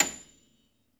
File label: pno A6, Classical Piano; Best Service ProSamples vol.53 - Classical Prepared Pianos; Total Piano; Brilliand Classical Piano; Classical Piano mp -ped